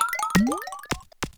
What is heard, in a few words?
Defeated